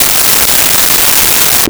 Gas Leak, Mechanical

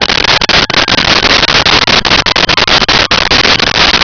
pod binder steady loop

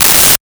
Index Card Tear; Office Supplies; 1001 Sound Effects

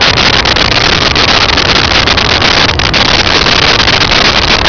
pod steady lo loop

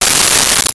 Rachet; Tools; 1001 Sound Effects

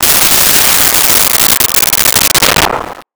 Missile, Weapons; 1001 Sound Effects

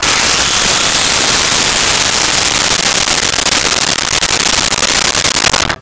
Circular Saw; Tools

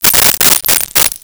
Coins Poured Out, Foley

